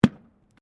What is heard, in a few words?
Witches Hat Drop, Traffic cones; Drop Sound Effects; Drops (Sound Effects:Objects)